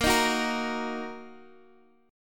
A sharp Suspended 4th Sharp 5th A sharp, Guitar, Open D